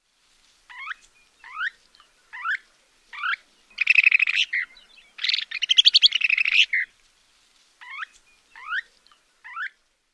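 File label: calls and song, bird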